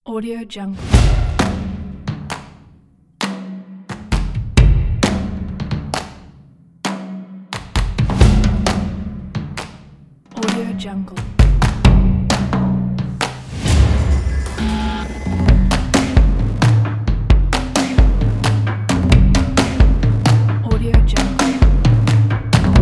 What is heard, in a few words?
ethnic percussion drums; stannau